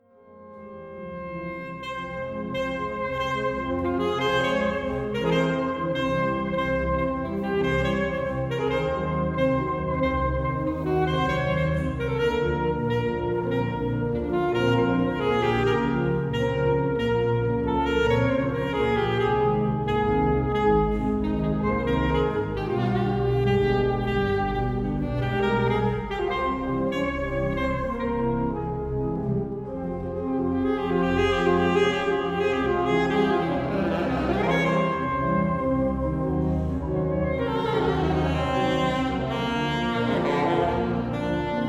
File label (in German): Milonga Sax Orgel; saxophon; Friedenskirche KS; Friedenskirche KS 08.09.2023